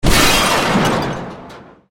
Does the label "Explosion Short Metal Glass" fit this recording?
Yes